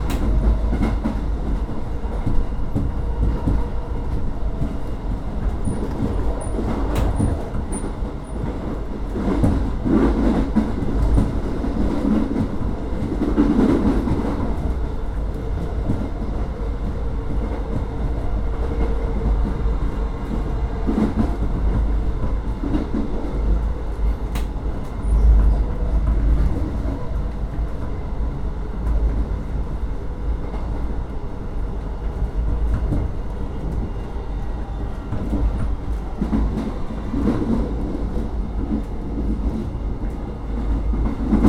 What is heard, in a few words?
Train Interior